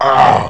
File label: pain; quake2; brawn